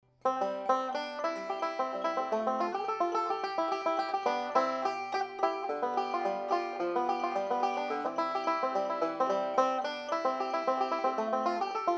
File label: stelling carbon fibre, banjo tone rings; carbon fiber tone rings